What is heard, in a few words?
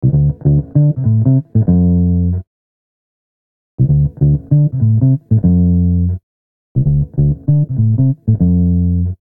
bass original EQ1 EQ2